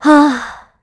Sigh, kr; Hilda; Kings Raid

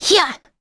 Vox Attack; Hilda; kingsraid; voices heroes

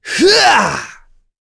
Vox Attack; Baudouin; kings raid; voices heroes en

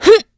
Vox Jump; kingsraid; voices heroes en

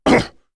Damage; Kaulah; kingsraid; voices heroes en

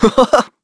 Laugh, Vox; en; Evan; kingsraid

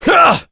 Vox Attack; Gau; voices heroes en; kingsraid

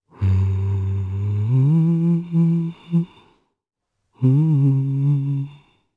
Hum, jp; Riheet; kingsraid

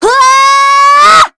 Vox Casting; en; Sonia; Kings Raid

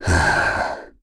Vox Sigh; KaselB; kingsraid

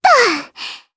Vox Landing, jp; voices heroes jp; Annette; kingsraid

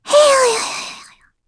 Vox Sigh kr; heroes; Lavril; kings raid

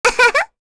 Vox Laugh; Rehartna; kingsraid

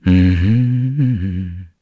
Hum, kr, voices; heroes; Zafir; kings raid

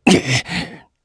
Vox Damage jp; Ezekiel; kingsraid

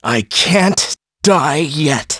Vox Dead; Riheet; kingsraid

